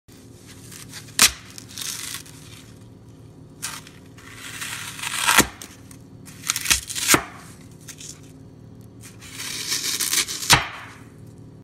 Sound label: Flower sponge, صدای برش اسفنج گل